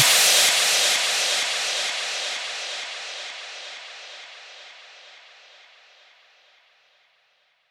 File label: Impacts